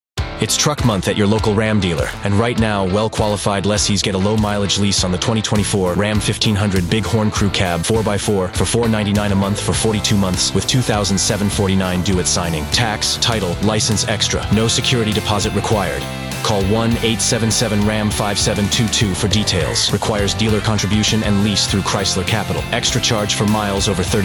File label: HipHop